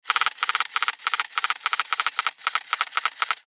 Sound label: Toy Gun Plastic Shots Multiple; Toy Guns; Toys; Foley